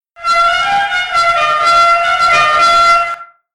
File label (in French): son klaxon la feria